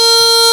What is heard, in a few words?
HARMONICA A#3, ORGAN